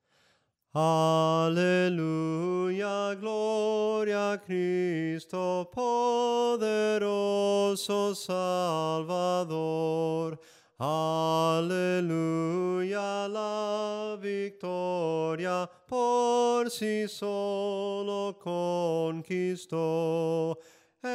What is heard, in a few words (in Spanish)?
SOPRANO CANTADO, himnos en melodia soprano